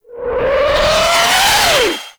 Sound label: Artillery Whizzby; Enemies; Abilities; Warframe; Teralyst